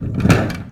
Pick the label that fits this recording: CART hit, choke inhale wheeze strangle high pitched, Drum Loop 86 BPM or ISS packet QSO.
CART hit